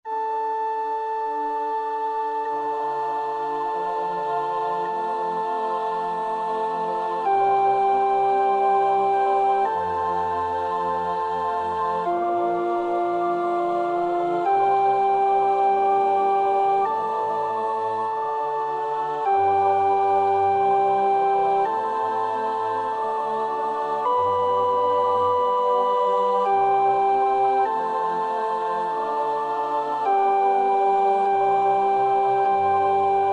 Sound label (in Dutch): sopraan; Tomas Luis de Victoria; Officium defunctorum a 6